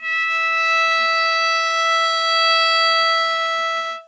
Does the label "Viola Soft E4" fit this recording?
Yes